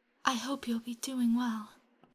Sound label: vocals